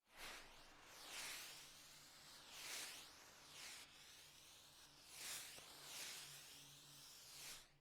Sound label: smoke bomb